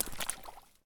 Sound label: swamp walk; Escape from Tarkov